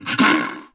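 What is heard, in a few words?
Bear Attack Vox; Civ4 warlords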